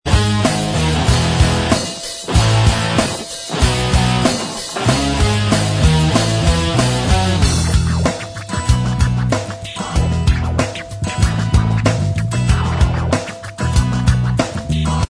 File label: Inst Track, backing tracks